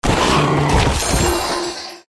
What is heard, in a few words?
Twoheaded Dog Die